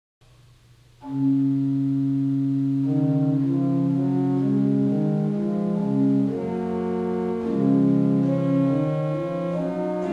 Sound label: improvisation on Principal 8; Tannenberg Moselem Springs